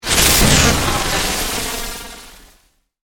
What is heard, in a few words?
Electrical Shock, Wave, Warble, Science Fiction; BlastWave